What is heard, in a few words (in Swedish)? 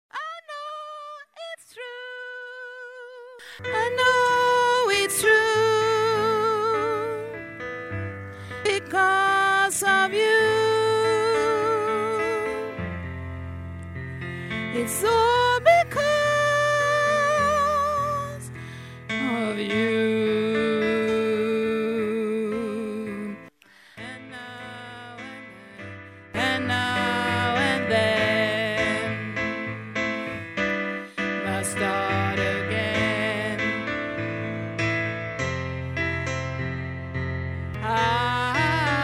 bas, stammor